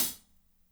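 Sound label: closed hi hat, BG HI-HATS; Spectrasonics; Burning Grooves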